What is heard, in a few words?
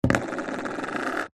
money coin set down on cardboard box; foley